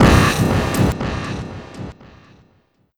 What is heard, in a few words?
Metal